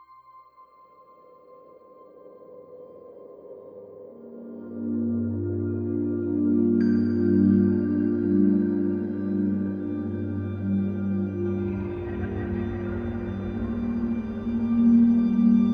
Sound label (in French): Ambiance Biome forêt Loop; sky break